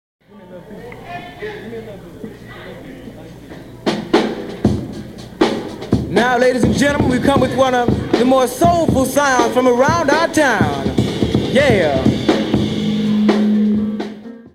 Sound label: Funky Beat